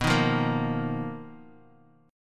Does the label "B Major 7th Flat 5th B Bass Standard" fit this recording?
Yes